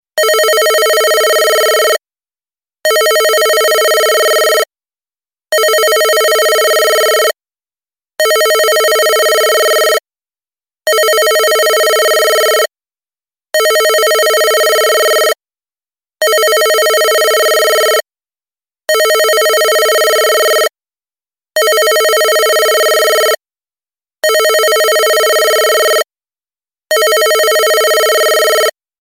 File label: Different old phone